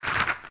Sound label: rackup, billiards; monkey2; PoolMod